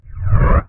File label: aura out; garrysmod